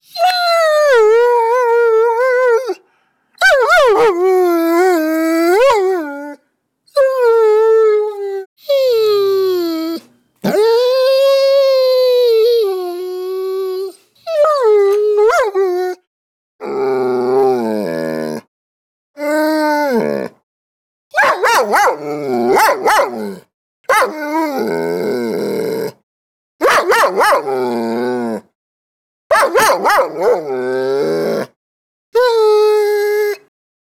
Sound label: DOG WHIMPER and BARK